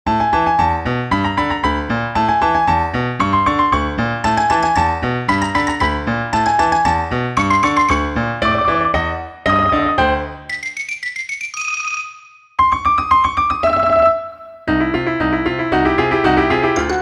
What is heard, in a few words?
Loop; hamster pinch